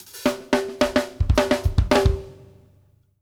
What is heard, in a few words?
OFF HAT, DRUMWORKS FILLS 108